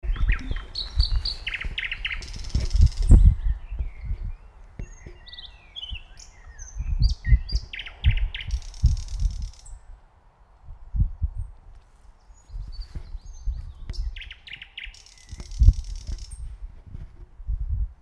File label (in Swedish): naktergal, birds